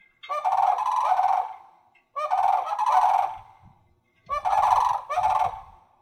Sandhill Crane Alarm

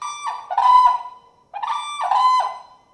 Whooping Crane Alarm; Guard Call; A sharp, single call expressing alarm.

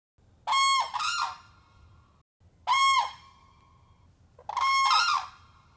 Alarm Black necked Pair, cranes

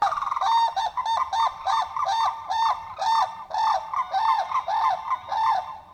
Sandhill Crane Unison

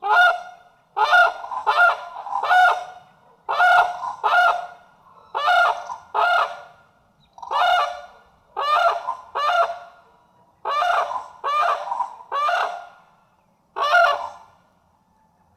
Brolga Alarm; Guard Call; A sharp, single call expressing alarm.

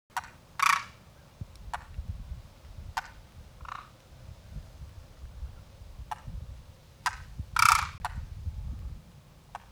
Contact Demoiselle Pair

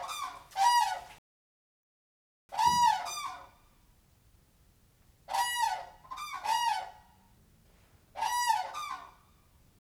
Alarm Eurasian Pair, cranes

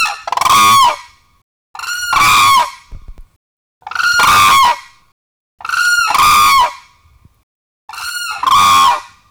Alarm Hooded Pair, crane

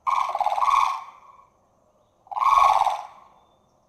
Blue Crane Alarm; Guard Call; A sharp, single call expressing alarm.

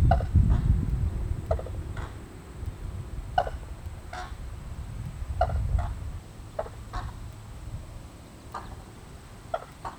Contact Red Crowned Pair, cranes

